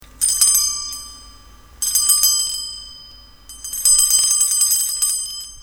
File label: bell